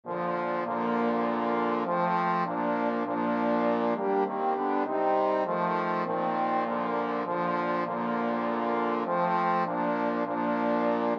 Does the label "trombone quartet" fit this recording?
Yes